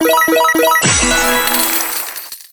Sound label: slot unique